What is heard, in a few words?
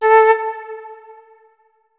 flute notes; Hideout Furniture